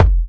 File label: gimble, Kicks Medium